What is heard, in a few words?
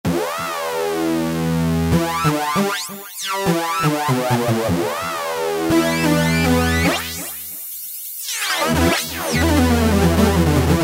Korg microKORG Reverse Sync Lead; Factory Voices